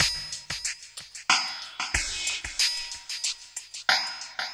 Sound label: CHUGGI, Loops; Distored Reality